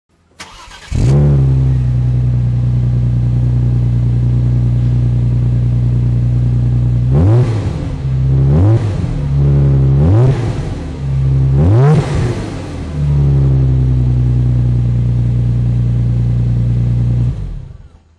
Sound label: neuspeed, audi s4 exhaust